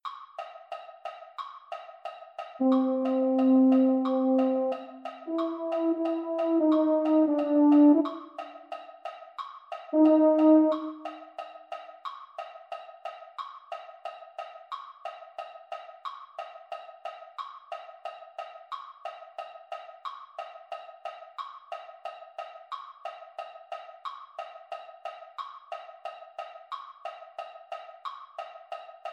baritone; holiday brass

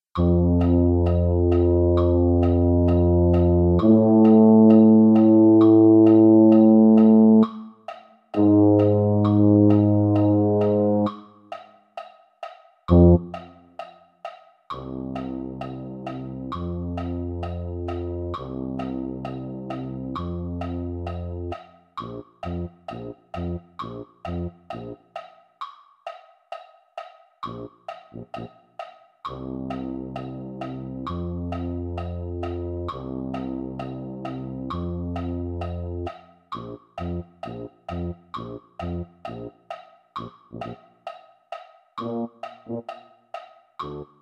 tuba; concert corps

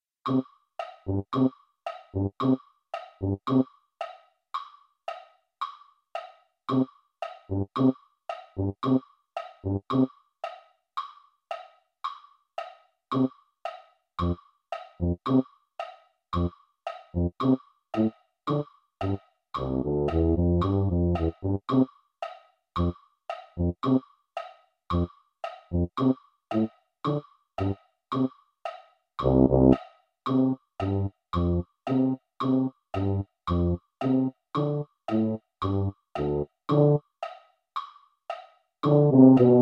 tuba; holiday brass

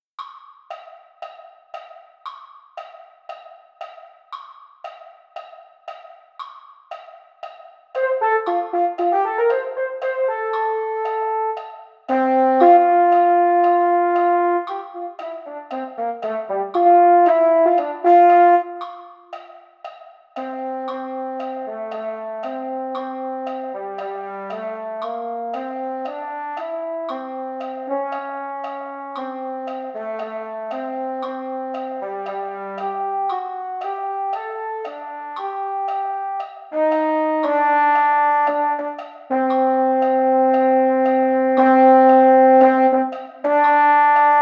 mellophone; patriotic brass

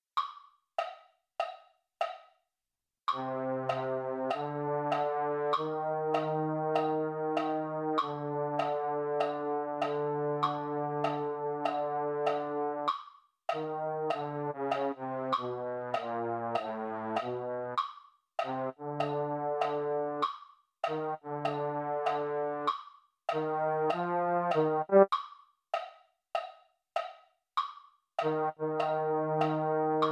baritone, MIDI; mini corps

